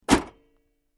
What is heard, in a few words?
Truck Tailgate Close, dodge ram v 10